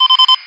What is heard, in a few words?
Beeping Signal